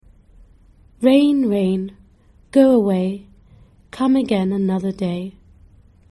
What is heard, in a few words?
rain rain go away